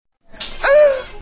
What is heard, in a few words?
moooan